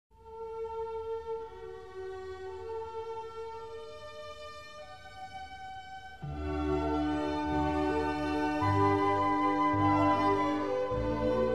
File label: Violin Concerto No. 3 in G major; Wolfgang Amadeus Mozart; Mozart Greatest Hits